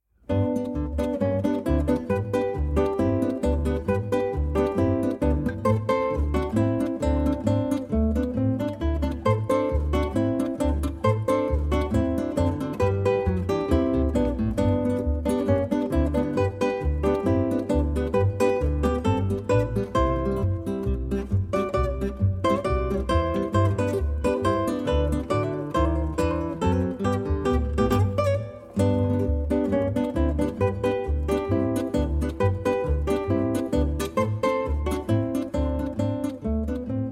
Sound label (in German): Polka